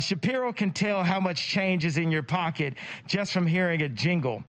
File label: jingle jingle; shapiro